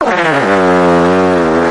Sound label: fart medium